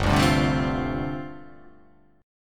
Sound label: G 9th Suspended 4th